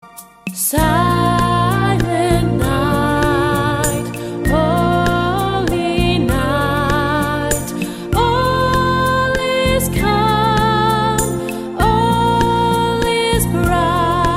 Silent Night avec Paroles

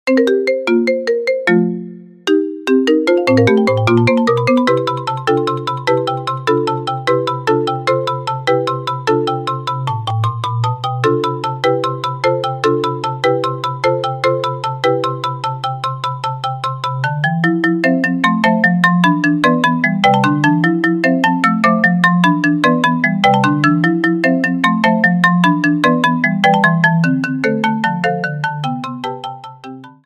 Marimba; Green Hill Zone; Sonic the Hedgehog

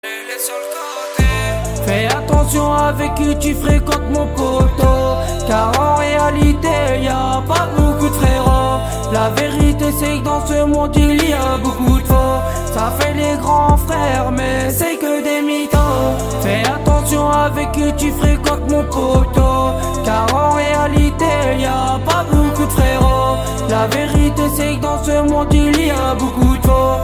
Instrumental

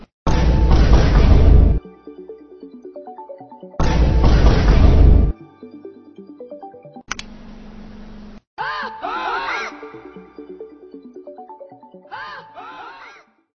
The Rock huawei

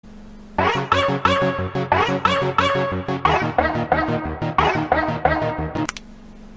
Talking Ben Siemens

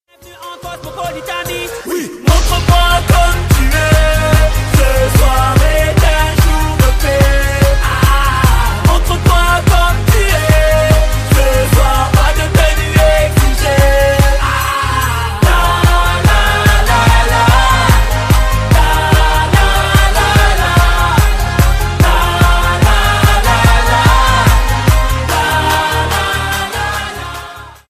Soprano

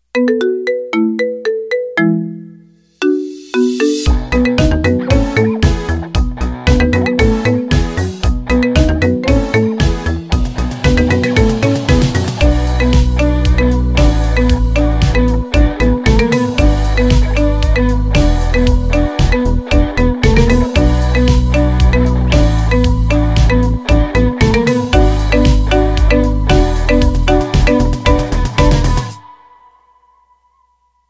Theme Song Marimba

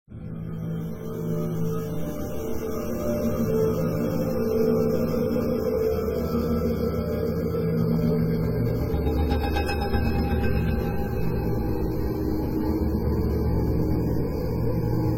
Cinematic Scary Atmosphere

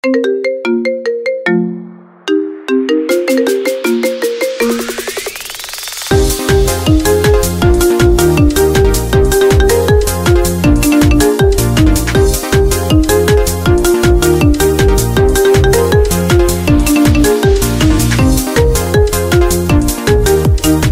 Ringtone Marimba

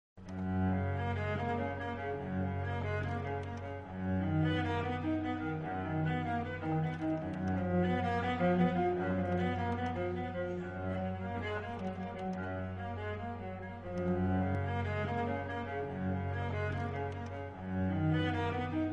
Bach Cello Suite

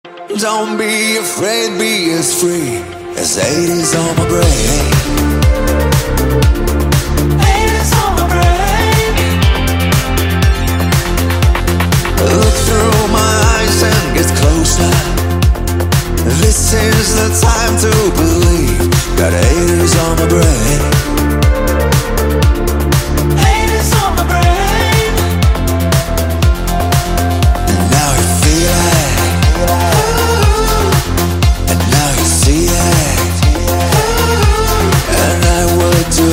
Crazy Loop

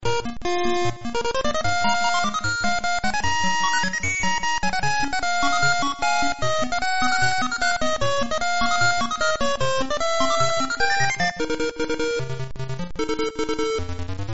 Ringtone Zelda

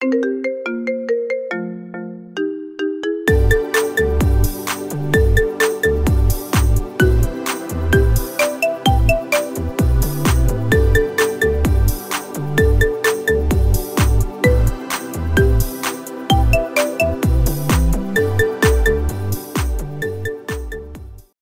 Marimba Remix

